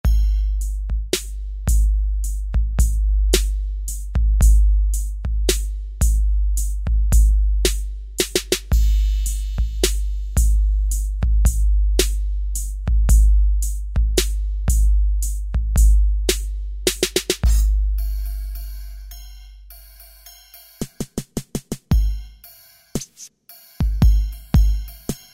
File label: EDrum Kit, Kurzweil K2000; Kurzweil K2000 Contemporary ROM